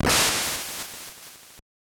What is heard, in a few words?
Gunshot; Duck Hunt